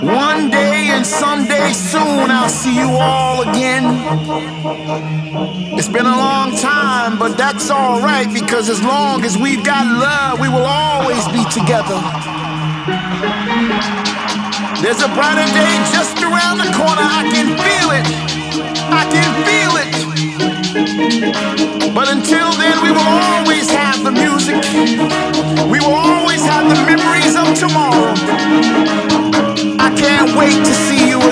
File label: Acapella